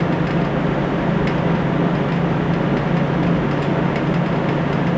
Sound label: ac vent blow